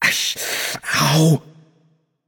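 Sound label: nonverbal ouch; voicepack; smite; merlin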